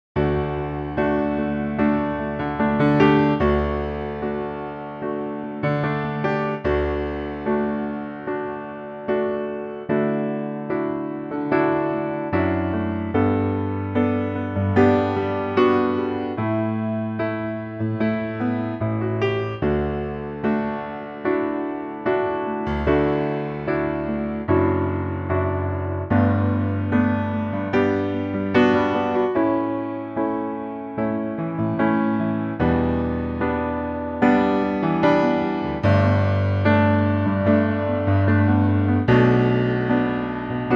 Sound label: D piano; key - D; Pianotrak